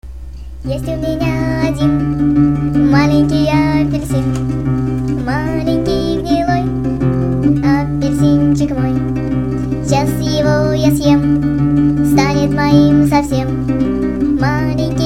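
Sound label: Child voice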